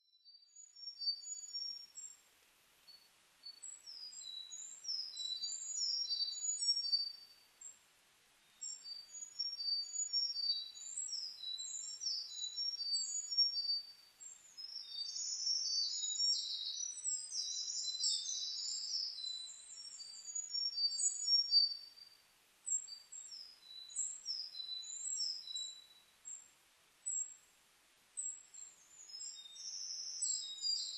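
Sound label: birdsong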